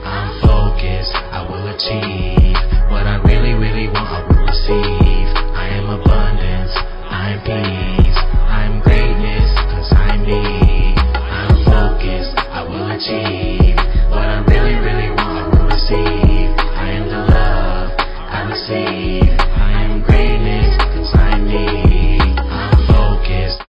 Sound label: mental health music